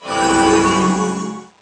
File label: voodoo heal